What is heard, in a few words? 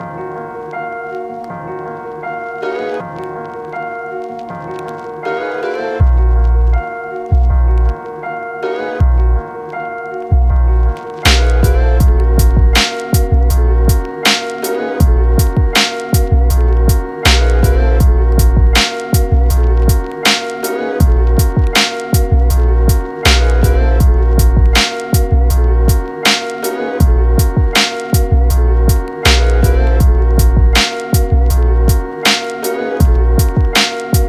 Remix Instrumental